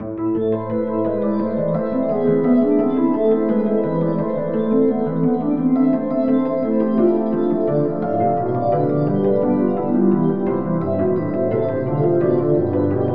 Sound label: Pipe Organ; Bach